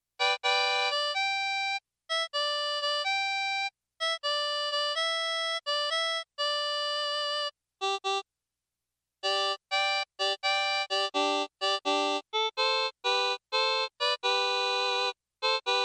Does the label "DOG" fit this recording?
No